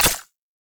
UI Mod Rank Up Selection; Mods

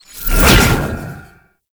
summon rune cast burst; Warframe; Uriel; Vythelas

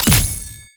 Tenno Revive Start; Warframe

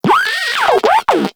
Moa Compassionate Vocal Affirmation; Warframe

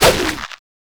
Water Balloon Fire

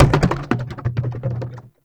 Large Plastic Garbage Bin; Warframe; Ambience; Nineteen Ninety Nine

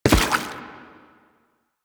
Lasrian Butcher Step Water, Footsteps

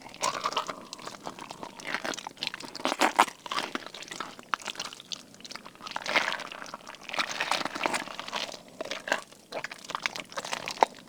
Infested Gore Loop Squishy Thick Slow; Ambience; Props; Warframe; Infested Eris

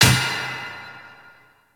Worker Hammer Impact; Ambience; Props; Warframe; Venus Open World; Venus Solaris United

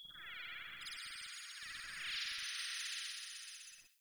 Venus Landscape Distant Corpus Texture; Warframe; Venus Open World; Ambience

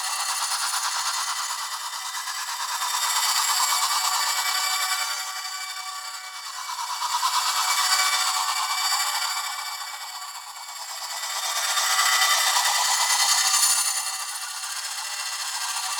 Lantern Shine Loop; Warframe; Emissary Season Two

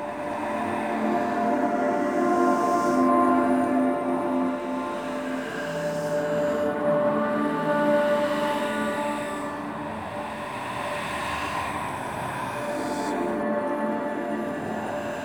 Tarot UI Emotion Choice Amb Loop; Warframe; Harrow

